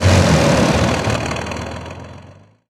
BMP Engine Acc End; DCS

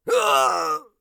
death; Escape from Tarkov

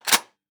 ak74u bolt forward; Rust

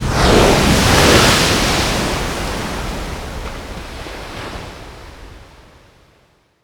wave crash; Modern Warfare

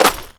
gravel run

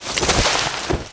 dying crawl

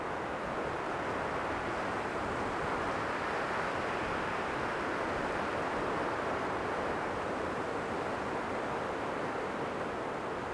wind milbase; World of Tanks Blitz; ambient milbase

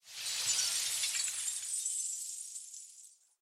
explosive glass layer

